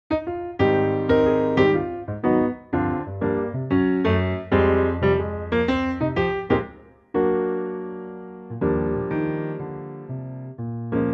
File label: Eb piano, male; key - Eb - vocal range - Bb to F; Wonderful old showtune standard in a lightly swinging piano arrangement.